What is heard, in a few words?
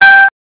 One Min Beep; LG SH400 Alibi